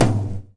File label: TT ROCK HI; Electro Soul; Hip Hop; Electro Soul Kit; style kits